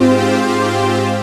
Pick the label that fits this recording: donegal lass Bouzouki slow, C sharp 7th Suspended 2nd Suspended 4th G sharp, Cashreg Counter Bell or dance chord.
dance chord